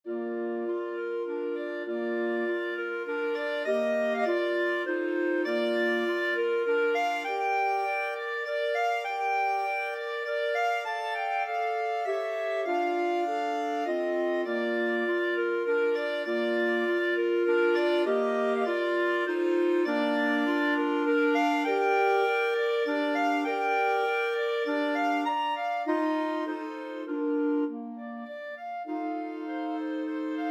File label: waltz, clarinet quartet; brahms